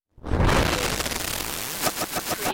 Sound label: Scifi Production Element - Startup Phase